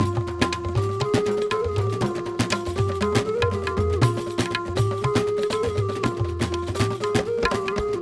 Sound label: percs; music world